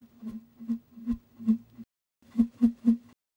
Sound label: ETHNO 125, FX